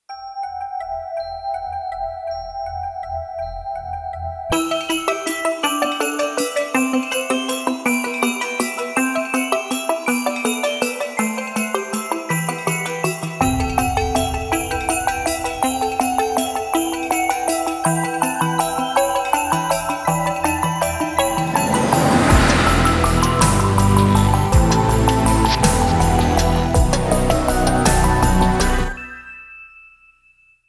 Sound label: BREAKBEATS BELLS